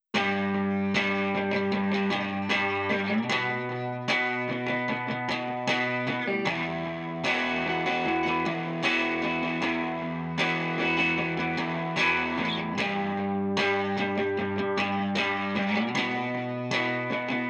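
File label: processed rhythm guitar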